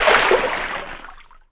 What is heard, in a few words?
water spurt splash; Tony Hawk's Project 8; XBOX